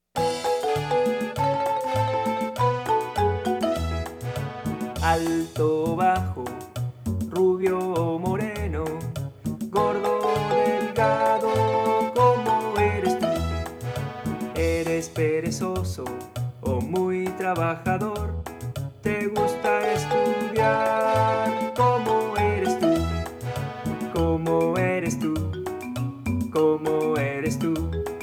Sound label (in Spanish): (Vocals)